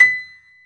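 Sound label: grand piano; B-GRAND PF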